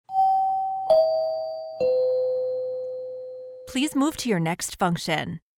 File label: three chimes descending please move to your next function